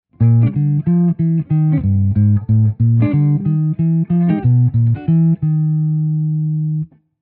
guitar sound

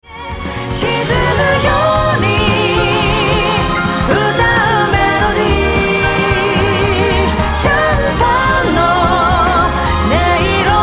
Mix Vol Automation Strings Auto On

